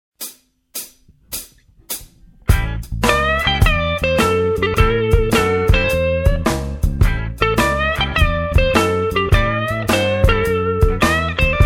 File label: blues jazz